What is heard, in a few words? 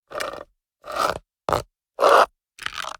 dragging on asphalt